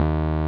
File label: Roxichord, E.Piano; Roland LCDP10 Keys of the 60s and 70s 2